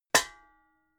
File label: pots hit hard